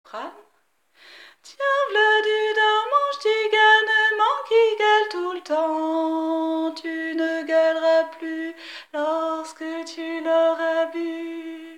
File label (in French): Dormant sop Refrain